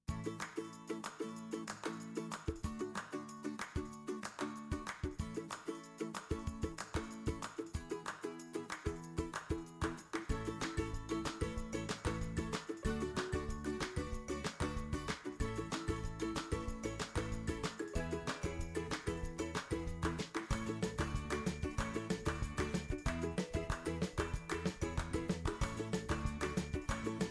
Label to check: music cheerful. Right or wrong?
Right